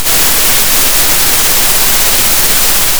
pop, Sound of a thunderstorm

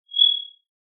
Sound of an owl

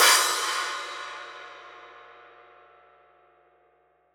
Trash C, drum

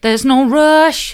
theres no rush mid, vocal

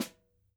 Snr, drum

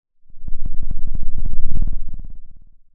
Sound of a lion’s roar

